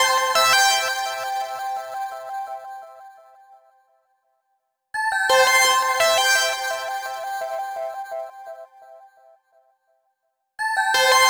Fresh Synth

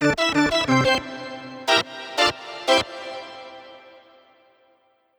Tone Organ

